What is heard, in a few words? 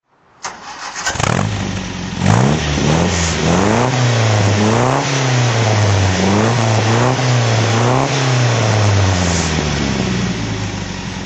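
mga 1600 throttle, engine sound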